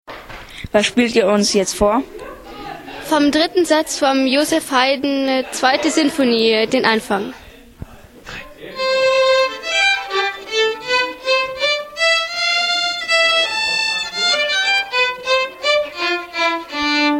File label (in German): Geigenvorspiel; culture kids